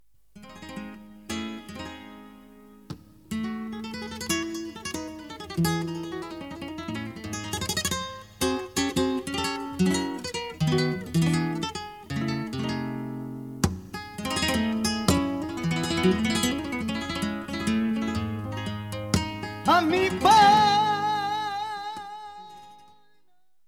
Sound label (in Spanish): solea, flamenco